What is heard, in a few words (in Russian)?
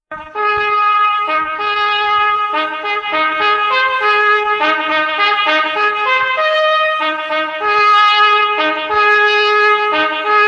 gorn podem